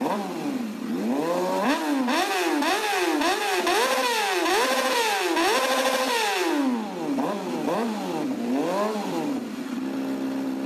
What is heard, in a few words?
flashy Engine; anemoi